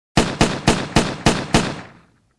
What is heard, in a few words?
Fast multiple gun shots